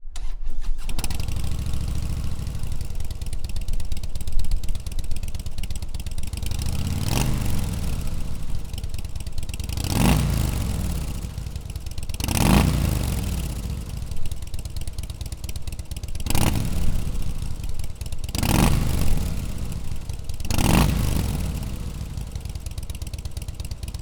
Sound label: 2014 Softail 103 STOCK Rev